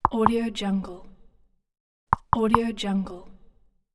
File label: knock knock